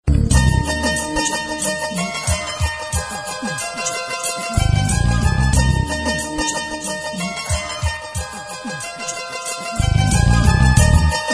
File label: Bgm; Alaipayuthe